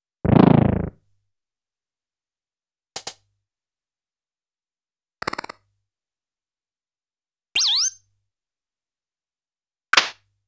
The Rope Lift is Moving! ~ Bull Whistling; super mario world live; The Lava Dragon Arises; Koumori Rising; Balloon

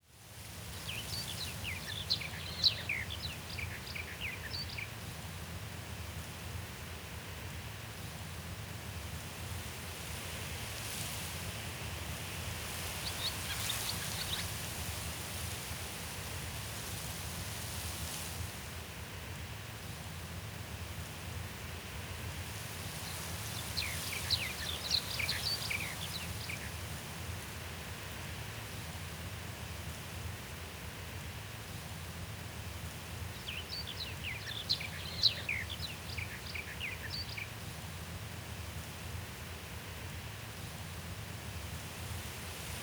(Atmosphere) Wind Kissed River; Wii Play Motion

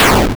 Stomp; Gloom

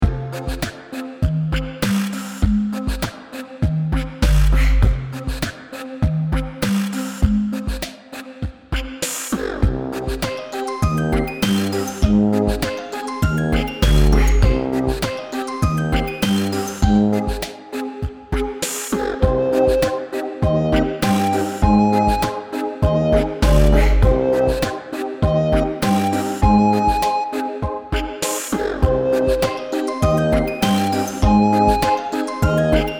Kids Gameplay; Kahoot

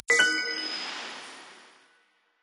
SFX UI Enter; gamerip; shuimufeifanjing

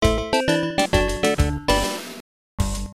Mortifying Melody; arcade; GP Rider

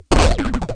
machine

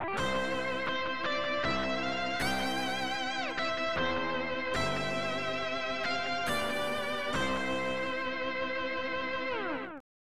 American National Anthem; Ken Griffey Jr. Presents Major League Baseball; SNES

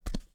Necromancer Walk; portal quest android

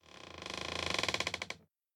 wood creak

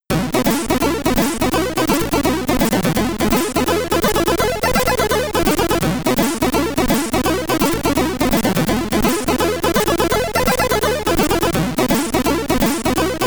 Mode Selection (SID Stereo); Teenage Mutant Hero Turtles The Coin-Op; Commodore 64

